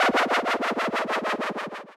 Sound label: death; CultManor